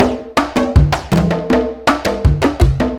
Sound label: Conga Groove, Percussion Loops